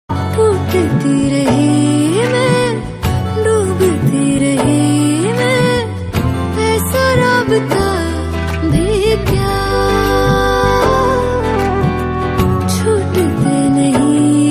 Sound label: Female Version; Notebook